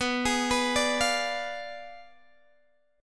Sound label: B Diminished 7th B down Guitar Open G